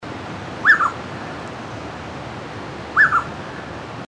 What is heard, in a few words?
Spot breasted Scimitar Babbler, birdcall; Pomatorhinus erythrocnemis